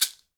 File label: Maracas; Latin Percussion; Drums; Drum Hits; Shaker; Ableton; Factory Packs